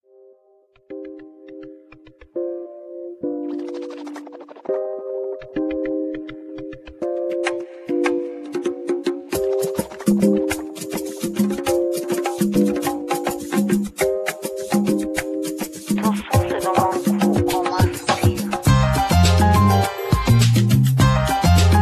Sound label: eurogroove